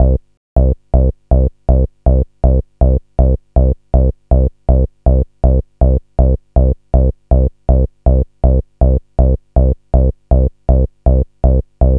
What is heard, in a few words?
gro 160, Hardcore Loops, Bass Loops; Dance Synths